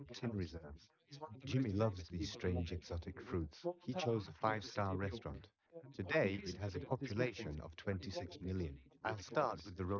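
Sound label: male, instantaneous mixture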